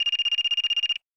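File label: system mobile phone mail; gamerip; KTAI MAIL; PS3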